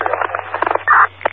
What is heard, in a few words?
Radio, radio effect